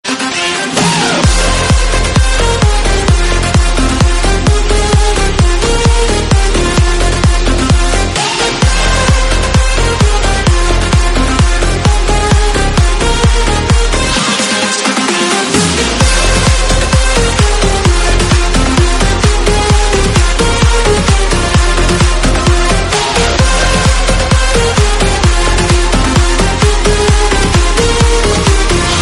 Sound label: Electric Bass